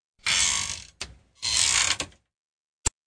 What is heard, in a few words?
Track, Doors; 헐리웃특수효과음; 문 소리